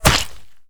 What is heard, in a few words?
bullet impact body flesh